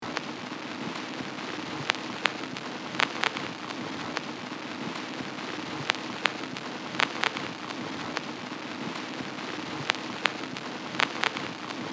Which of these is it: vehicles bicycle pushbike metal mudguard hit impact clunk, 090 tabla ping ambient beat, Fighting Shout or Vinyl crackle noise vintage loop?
Vinyl crackle noise vintage loop